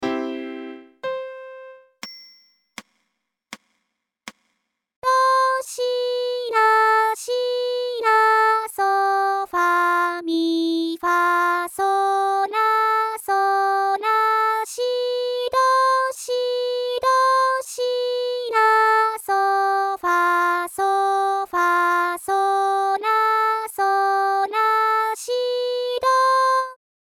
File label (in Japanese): ボーカル音声; コールユーブンゲン No.6 b)